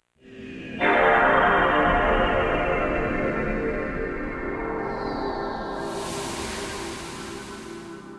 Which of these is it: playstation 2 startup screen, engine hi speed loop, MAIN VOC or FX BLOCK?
playstation 2 startup screen